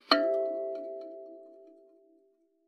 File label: KALIMBA E5; Xsample VOL-7